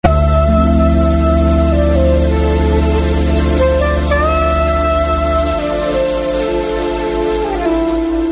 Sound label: melody